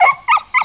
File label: pain; hungerhound